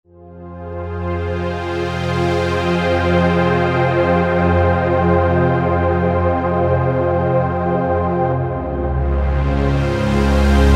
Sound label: SuperSaw Pad, Roland V-Synth; Factory Patches